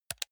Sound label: console controller playstation press fast hard